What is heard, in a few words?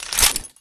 lmg bolt closed; Guns Bolt